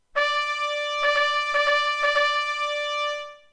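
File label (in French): cessez le feu sonnerie clairon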